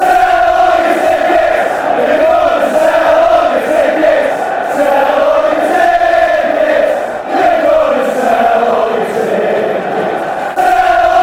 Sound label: couldnt sell all your tickets, chants